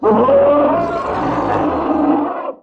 ORC WARN

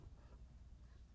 SLEEP

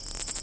WALK

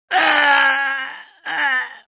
ARGH DEAD; mahlendur

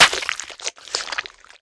sword final hit

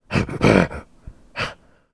breath

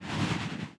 windfist invest blast

